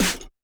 Snare, Roland TD-20

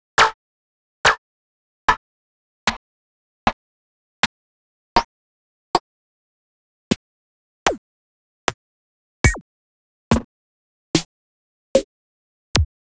KlingKlang, drums percs; drums&percs (chains); X-Static Goldmine

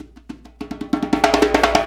PRC HAND, Africa Perc Loops; Roland LCDP14 Africa VOL-2

